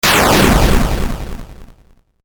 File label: 8 Bit Style Damage; Video Game Sound Effects